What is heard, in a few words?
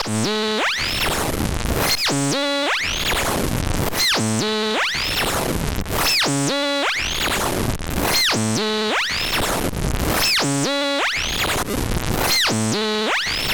SV 1 Nasty Lifeform; Pittsburgh Lifeforms; Achtung